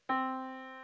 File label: Yamaha Disk, piano sound